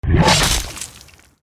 Werewolf claw slash strike

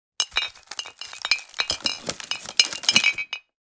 Wicker basket movement bottle clinks inside

